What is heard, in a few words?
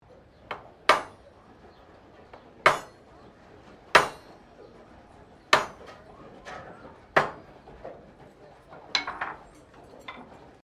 Blacksmith Hammering Sequence